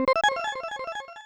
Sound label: Hypnosis; mother2